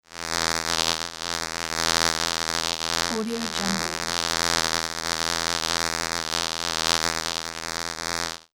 cartoon fly flying

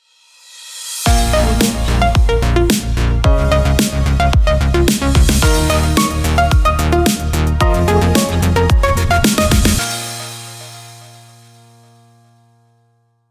breakbeat inspired logo

